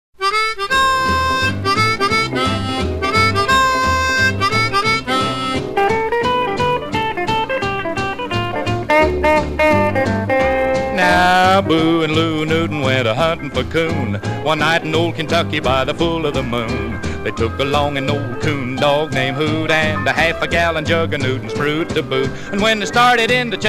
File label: Hootin Howlin